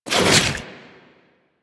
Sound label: Inf Transform Claws Whoosh, Infested